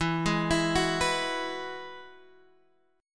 E Major Add 9th E, Guitar, DADGAD; chord shape

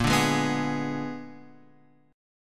Bb 7th Flat 9th Bb, Guitar Open F; Bb7b9; strummed; x,5,2,3,2,3; JGuitar

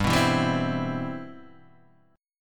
C Major 7th, G, guitar chord

